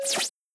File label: Item bonus; Touhou Jitsuzaisou Dream Logical World